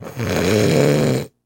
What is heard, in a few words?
Snort Male; sound effects bible